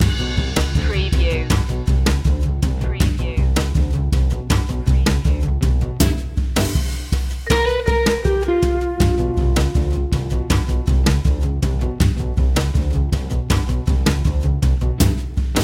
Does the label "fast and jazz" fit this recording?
Yes